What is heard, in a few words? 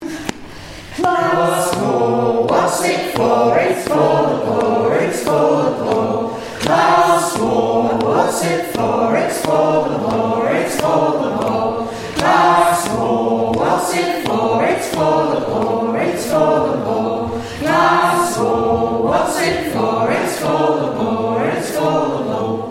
class war